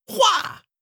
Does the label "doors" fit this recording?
No